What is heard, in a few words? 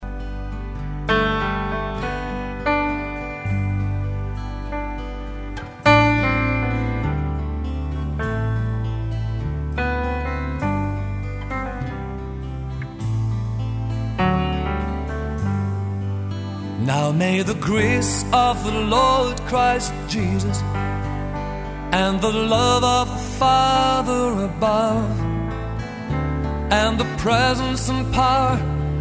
Piano